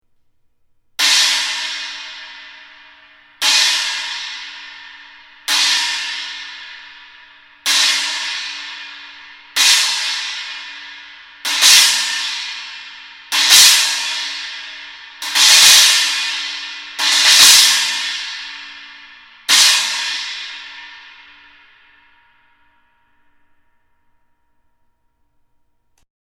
Holey X 16 China

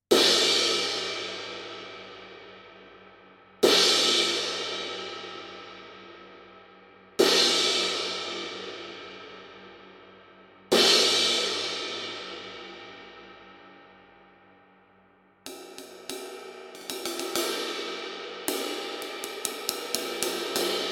20 Crash

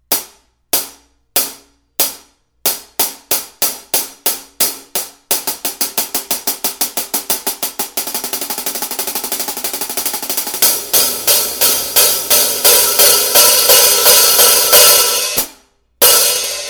14" Funk HH

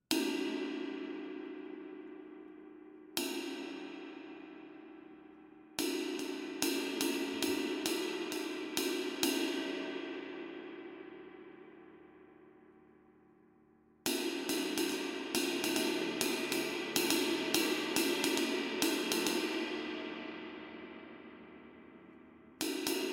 24 10 Ride

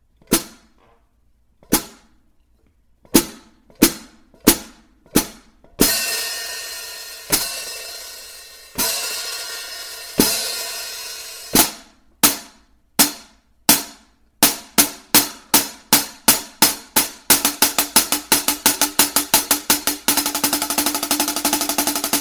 med Hats

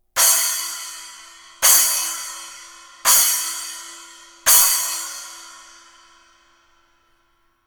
10in Classic Splash

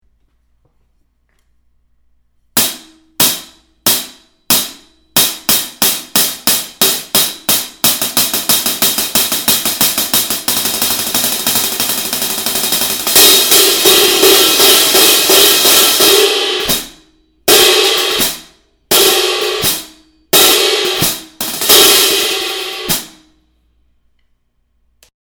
18 Raw HH; Heartbeat Raw Hi-hat Cymbals: Dark, meaty and sloshy sounding.

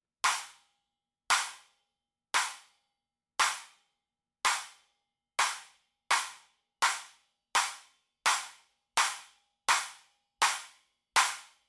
Smak Stack

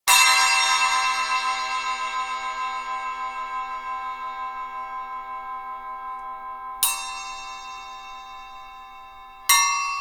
8in Classic Bell

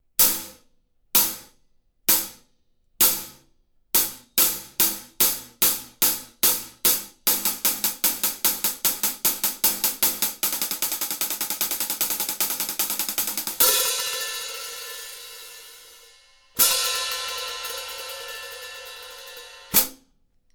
14in Classic hats

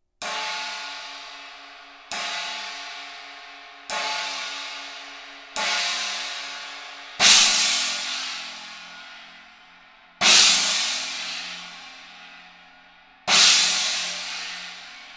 20in Rock China

